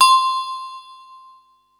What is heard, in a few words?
mallets